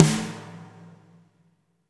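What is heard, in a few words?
reverb snare left; Spectrasonics; Burning Grooves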